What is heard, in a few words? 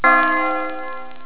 bell